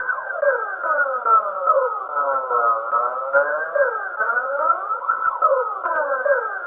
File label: atmospheric flange, 144; SYNTH AND ELECTRONIC LOOPS